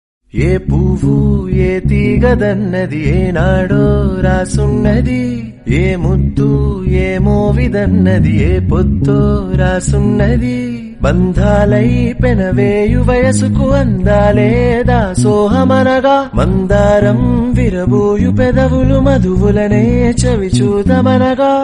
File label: tamil sad song; nireekshana